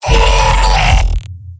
pyro m pain sharp; tf2; garrysmod; mvm

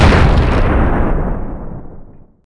meteor blow; kolmods proj impact

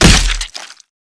body medium impact hard; Garry's Mod; tf2

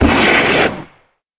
flame thrower airblast; tf2

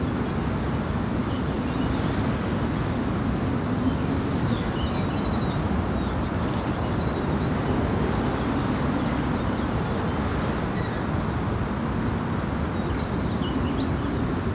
town, ambient; Garry's Mod; tf2; rottenburg

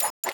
draw pistol; weapons; engineer; garrysmod; tf2

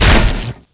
neon sign hit, weapons; tf2

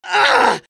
scout pain severe; vo

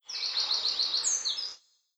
small bird; ambient; Garry's Mod; tf2; rottenburg

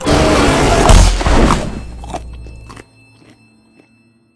Jumpscare; Garry's Mod; boxy boo v2; lunchboxy

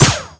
crit hit mini; Garry's Mod; tf2

